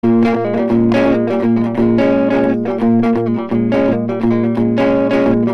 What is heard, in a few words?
shuffle